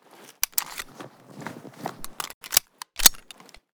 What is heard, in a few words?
makarov load; metro; weapon